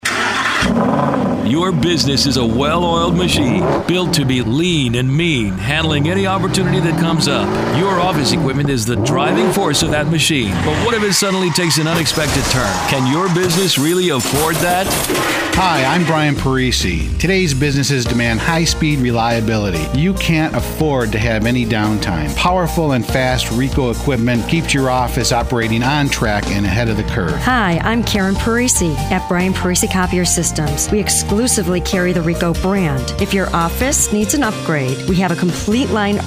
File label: Race car with crash; PARISI COPIERS